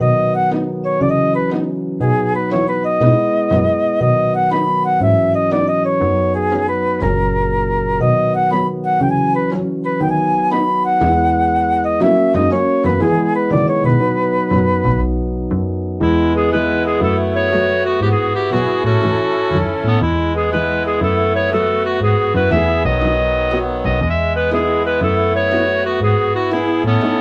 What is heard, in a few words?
activity